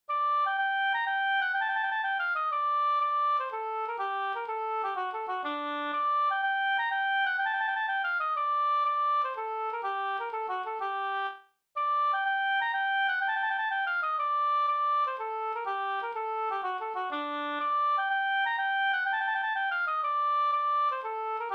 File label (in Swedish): polska brudpolska